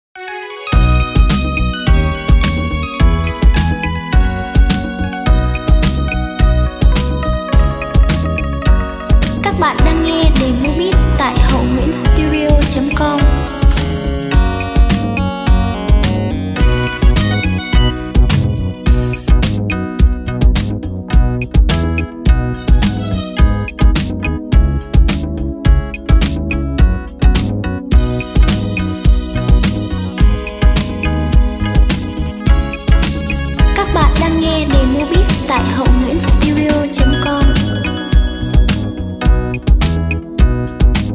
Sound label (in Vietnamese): beat